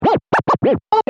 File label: Scratch